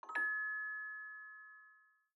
Photos Memories Notification, iOS Sounds

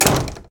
door close; Golden Crayon Sounds

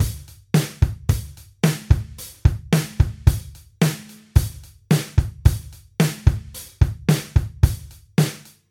Drum Loop Mono; iOS Sounds

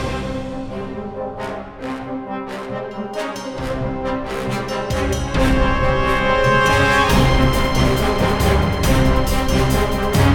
boss battle

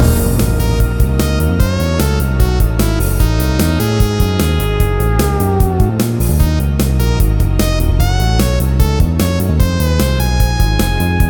intense tamer battle; Manamon Music; Manamon 2

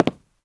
wood step; Golden Crayon Sounds

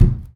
bathtub step; Golden Crayon Sounds

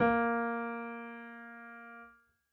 piano a#; Golden Crayon Sounds

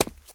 cement step; Original TK Sounds

